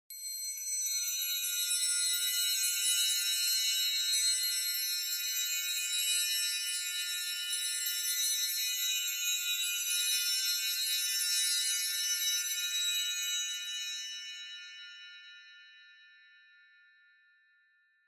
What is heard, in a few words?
Cluster Chimes (Reverb Long)